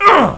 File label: pain